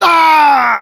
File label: pain severe